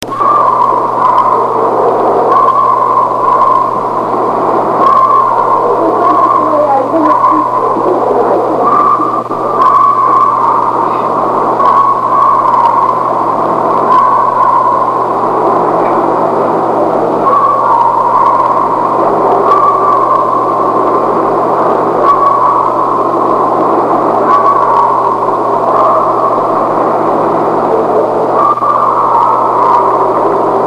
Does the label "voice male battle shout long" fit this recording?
No